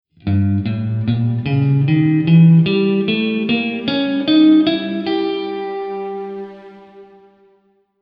Escala Simetrica, guitar

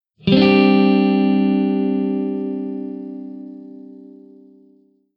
Campo Maior Acordes G4

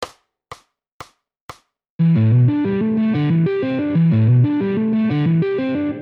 salto de cordas 120BPM; guitar

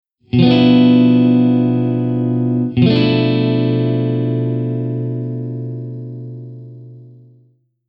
Campo Maior Acordes Bmb511 Bm7b511

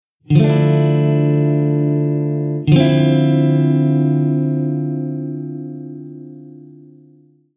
Campo Maior Acordes Dm9 Dm79

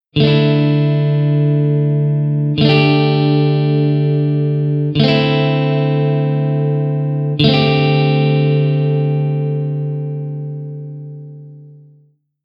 Campo Maior Acordes D2 D4 D72 D74

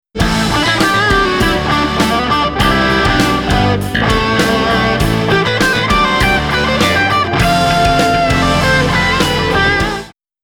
Blue Tone Solo do Riff, guitar

